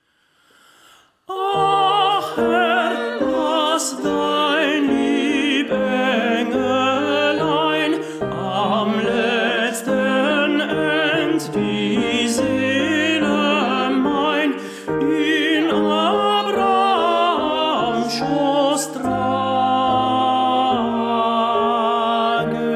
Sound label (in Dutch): tenor; ach herr